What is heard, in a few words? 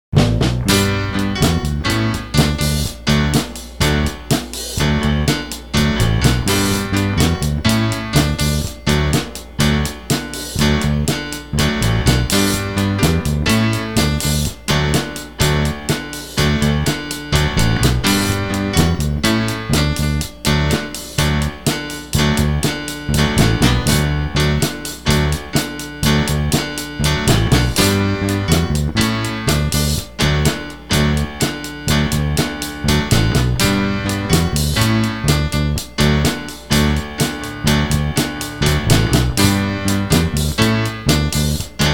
novocal